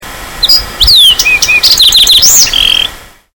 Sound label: fox